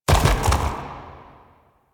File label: Unyielding Gallop Hooves Land; Warframe; Dagath